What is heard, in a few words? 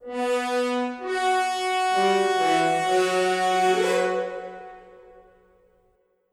Music Fanfare; Fable Anniversary